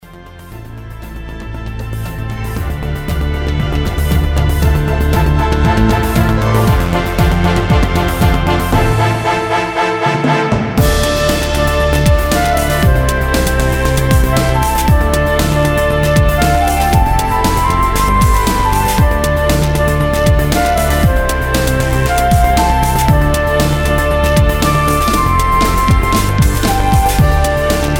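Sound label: Impromptu for Flute with Orchestra in D minor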